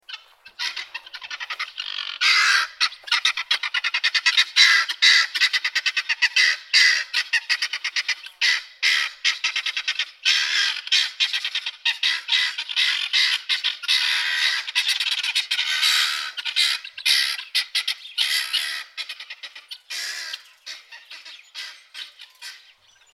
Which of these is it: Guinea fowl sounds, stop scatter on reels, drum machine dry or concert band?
Guinea fowl sounds